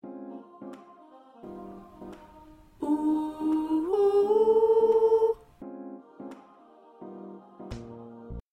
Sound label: Backup Voice